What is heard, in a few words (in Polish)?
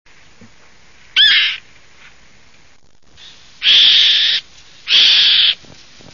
Kaniuk - Elanus caeruleus, głosy